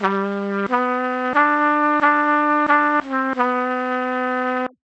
original trumpet

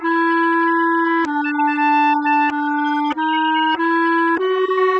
separated clarinet

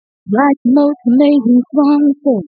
Separated Voiced Mixture; 5dB

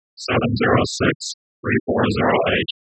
Noise factory; IBM

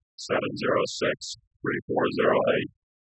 Noise ssn; IBM

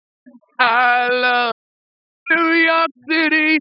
Separated Voiced Mixture 0dB

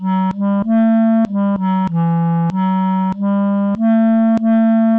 original clarinet

